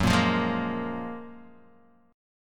F sharp Major 9th, Guitar Open D

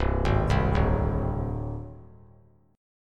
C Suspended 2nd Sharp 5th, Csus2#5 chord; arpeggiated; JGuitar

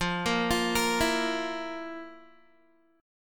F Major 7th Suspended 4th F, Guitar; arpeggiated

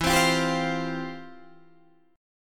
C Suspended 4th E; Csus4/E

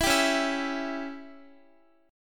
Db Diminished Db down Guitar; strummed; 4E,4A,5D,5G,5C,6F

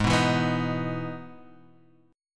E Major 7th Ab

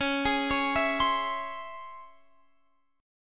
C sharp Minor Major 7th C sharp Guitar Open F; C#mM7; arpeggiated

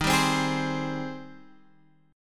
G sharp Major 7th; 11,10,10,13,13,x

